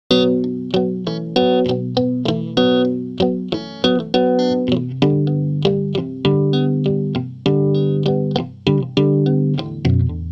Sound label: amp head muted progression clean; dr zee se cdc amp head